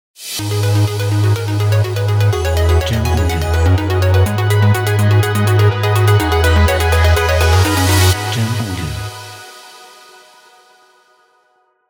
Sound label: Technology EDM Logo